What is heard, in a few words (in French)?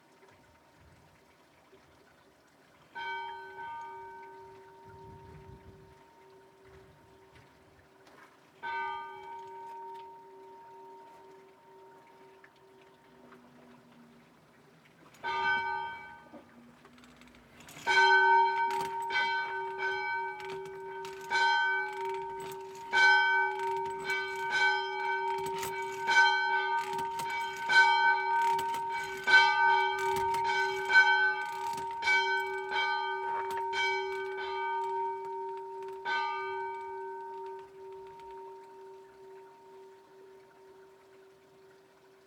cloche avec chaine; servies